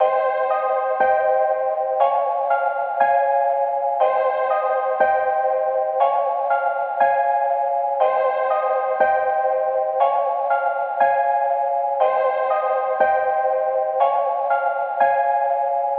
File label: 120 BPM F Min Chords; Trap; Melodies; Loop Stems; Cymatics; Trap Starter Pack; Delirium